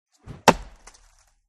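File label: Tomahawk Throw Impact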